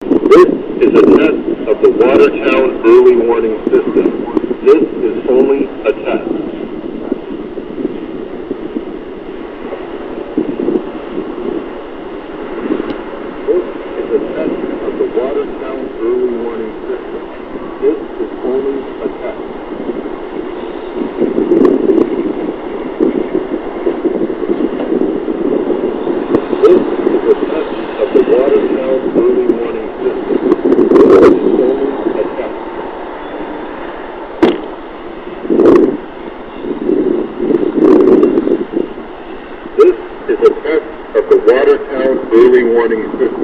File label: eows612st attack, sirens; EOWS * 612 Single Tone Attack; Siren Contest / entry